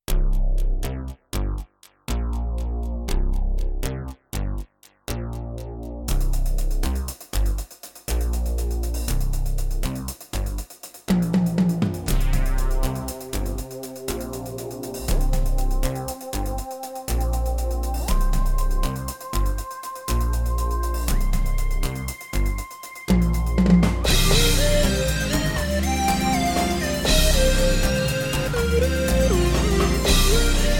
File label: electronic